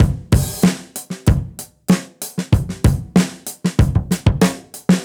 Beat 95, dusty funk; musicradar; Beats; 95bpm

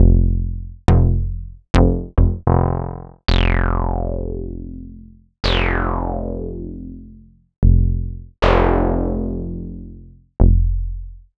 SCI Prophet-5 Bass

